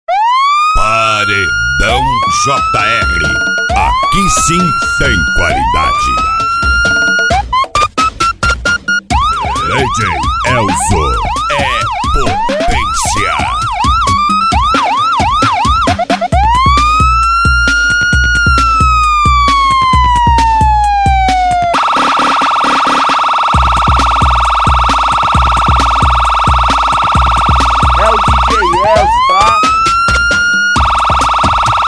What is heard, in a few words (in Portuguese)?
sirene; cd paredao jr 2023 so as top